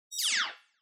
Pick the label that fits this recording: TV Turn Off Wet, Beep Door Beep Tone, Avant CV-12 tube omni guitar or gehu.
TV Turn Off Wet